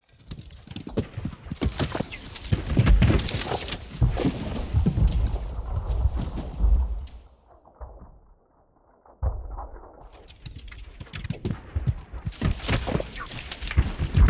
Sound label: Designed Rocks - Texture - Filtered; Gregor Quendel